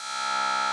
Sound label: noise